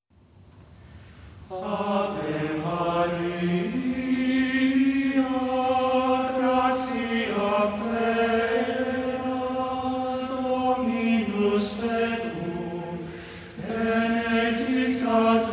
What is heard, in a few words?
ave maria chant; Adoremus Hymnal